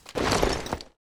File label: equip, rebar club; weapons; props dane